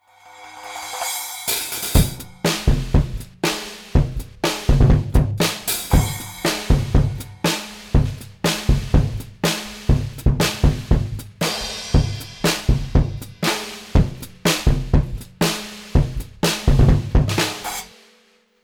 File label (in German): Mapex Drumkit FET Niere Mono Einzelspuren Summiert Dry; Lewitt LCT 1040